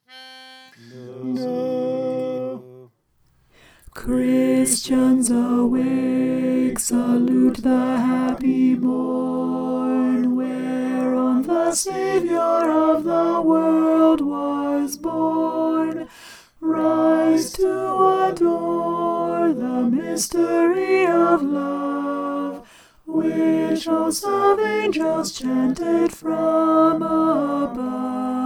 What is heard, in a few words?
alto